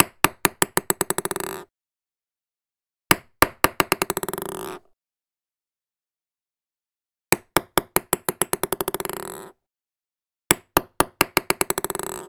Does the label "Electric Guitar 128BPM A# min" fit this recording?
No